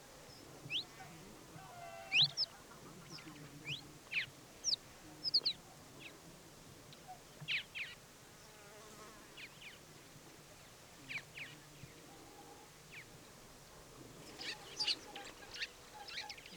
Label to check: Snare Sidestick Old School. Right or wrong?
Wrong